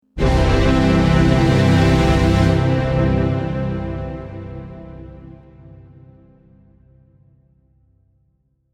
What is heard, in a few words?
Strings Overture, Orchestral strings overture in C.; Orchestral (Sound Effects:Musical)